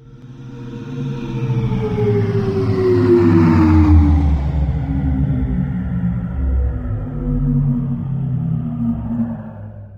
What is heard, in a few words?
battery drain; ships deepspacerepair; CosmicRage; CosmicRageSounds